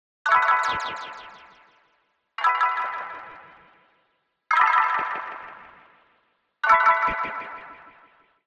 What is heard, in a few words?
Arcade Click Positive